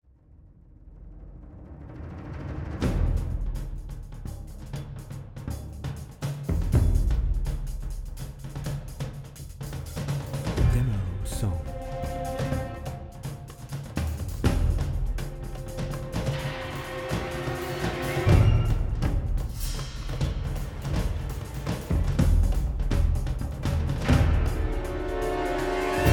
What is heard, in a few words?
124 BPM